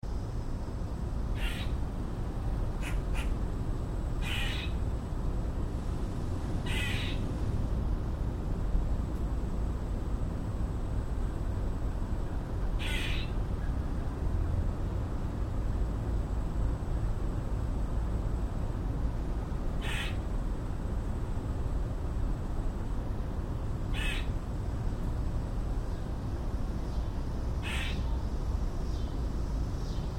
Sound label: squirrel calls cicadas buzz